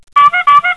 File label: honk honk